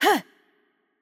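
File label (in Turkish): Attack Light, Turkish; VO; bloom minigame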